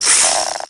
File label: snake furious